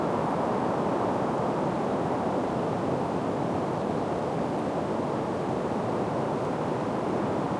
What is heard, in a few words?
ambient; World of Tanks